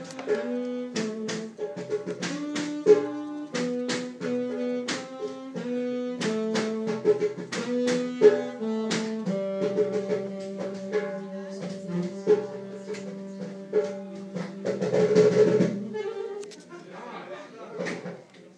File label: saxophonists entertaining the kids on the childrens ward in the hospital kids playi; kids playing drums